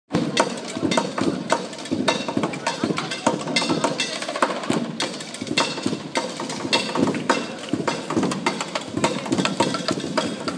the sound of stuff being drummed on Northumberland St